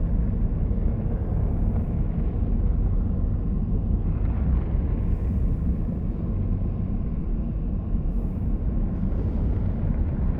ship mining station idle; aquatics

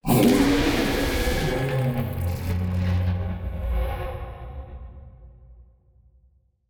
void worms nymph death; Stellaris; grand archive; ships

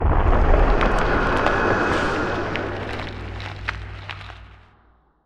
frozen planet; ambient; Stellaris

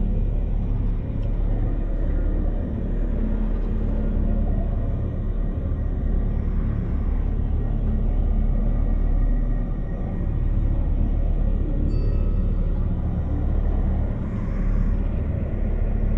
ship starbase idle; Stellaris; shroud

